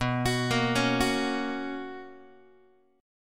B Major 7th Suspended 2nd Guitar, chord

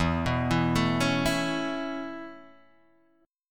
E Suspended 6th E

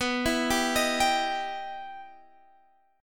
E Minor Major 7th

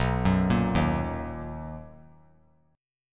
B Major 7th B down; arpeggiated; JGuitar

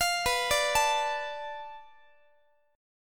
B-Minor 7th Flat 5th B down Guitar; arpeggiated; 4A,4E,5A,5D,5G#,6C#; x,x,20,21,18,20

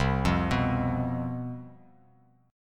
C Major 7th Suspended 4th, C, down, chord; arpeggiated

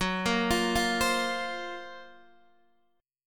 Gb Major 7th Flat 5th, down, Guitar, DADGAD

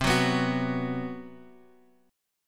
B 5th C, chord; B5/C; strummed; JGuitar

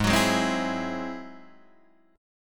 Ab Augmented 7th Ab down Guitar Open D

